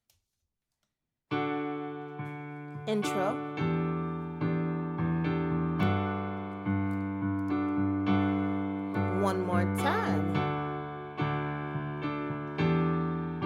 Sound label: Alto; 2024 summer choir